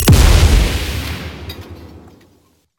Mine Explosion

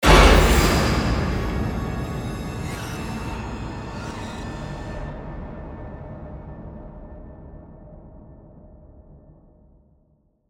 gameover; hello neighbor

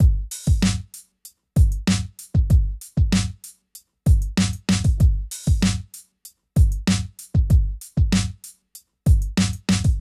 DRUMS, viral hiphop

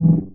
balloon bonus fail

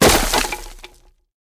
woodcrack

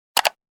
click

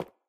land stone

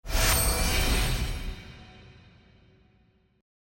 open

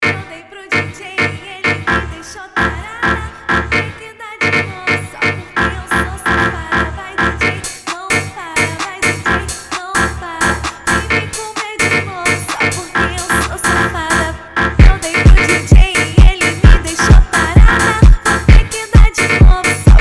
music

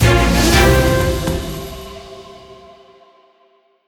mystery Start; jumanji

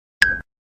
Wink

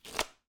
draw reset; Microsoft Solitaire Collection

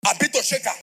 shaker

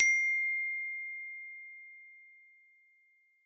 notice

